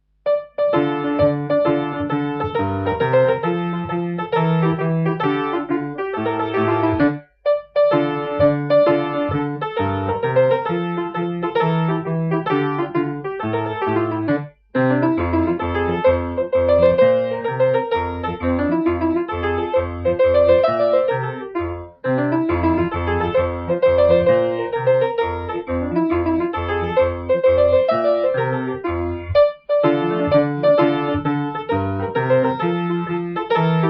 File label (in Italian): Tarantella musica; italija